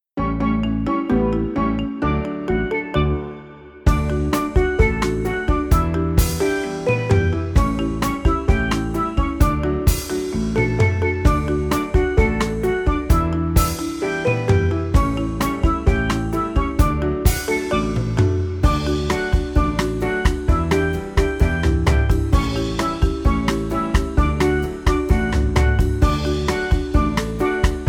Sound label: sambalele accompaniment